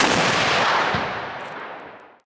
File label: gun; mcheli